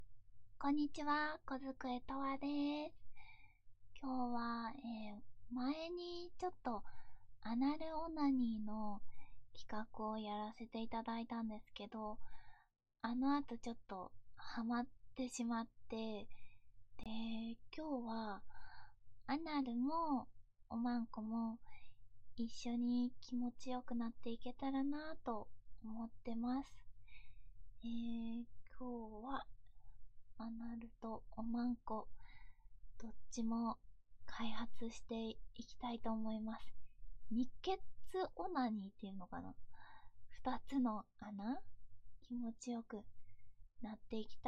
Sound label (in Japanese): オホ声, オホ声オナニー; アナルとおまんおどちらも開発2穴攻め